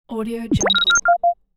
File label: tech glitch button